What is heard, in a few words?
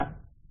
ball tray bounce, pool